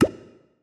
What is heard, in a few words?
click Release